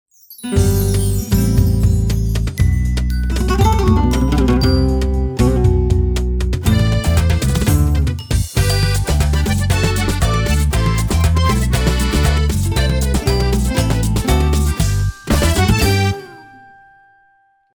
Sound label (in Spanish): jingle vino navidad